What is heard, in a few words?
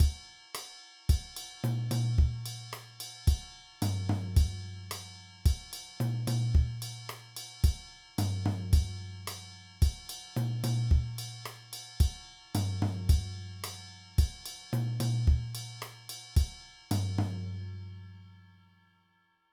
Mambo